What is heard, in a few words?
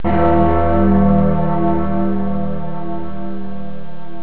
big bell